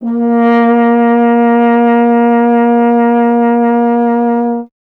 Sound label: F. Horn